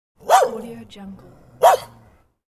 dog

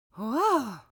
cartoon vocal amazed grandma; smartsoundfx

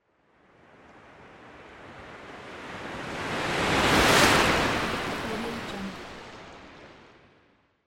water whoosh

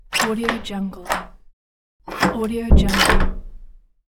opening and closing door

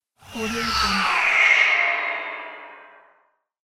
wraith ghost passby

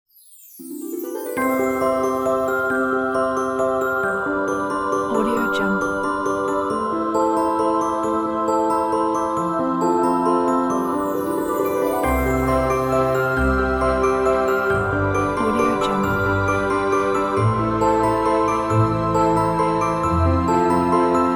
fabulous night ident

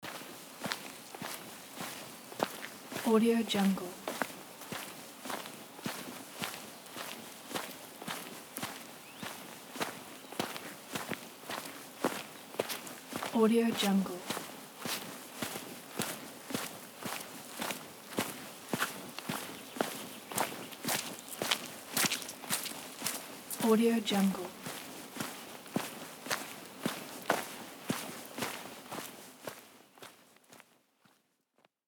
forest walking

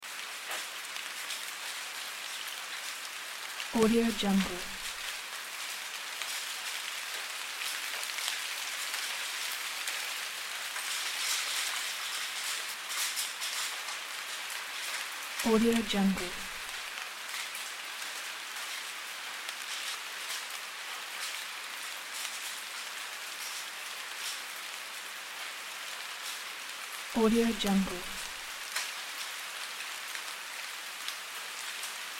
calming rain loop

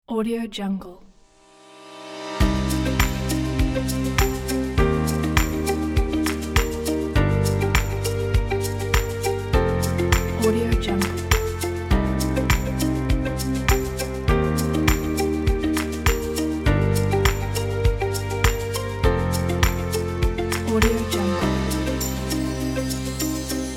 deep motivation corporate logo

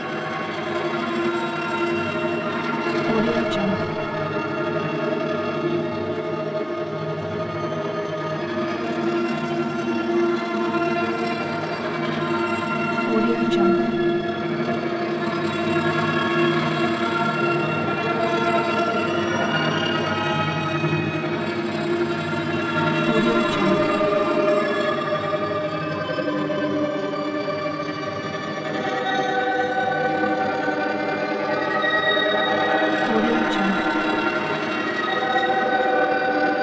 magic glow constant swirling tones

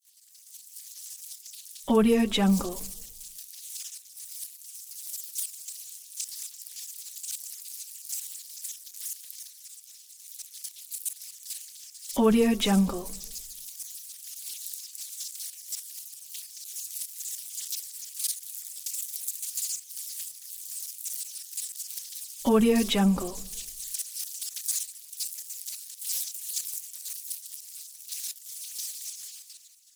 hectic alien ants colony sfx

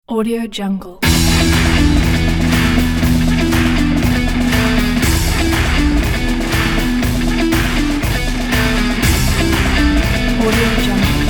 dub rock

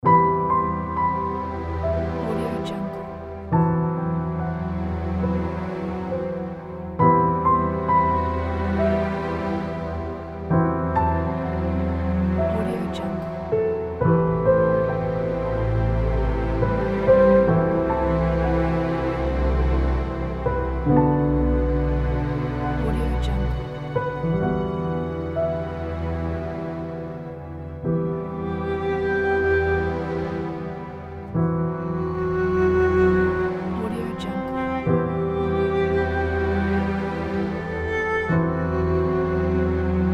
cinematic melancholy

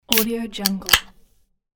can opening; javierpaniagua

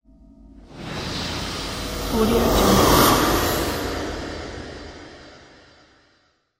cinematic horror exhale whoosh; archisound

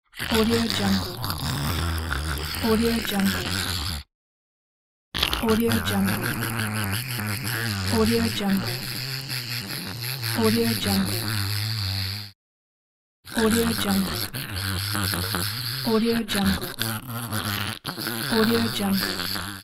comic and funny voice mouth and nose for cartoon

